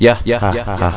yayahaha

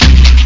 jungle bit

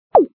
bonus sound; bubble t

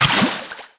water; GoldMinerJoe

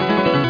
APPEAR

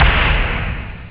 dzing; OctaMED SoundStudio V1

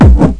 tr 909 non linear fx, bdrum samples

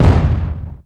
Tank Gun Light; 1944 Battle Of The Bulge

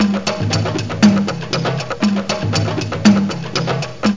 perc loop; OctaMED SoundStudio V1

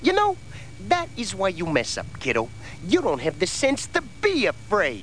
exit windows; Desktop Themes 2000; Comic; Beast Wars

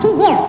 Pee-yoo, Cartoon sfx; Wayzata

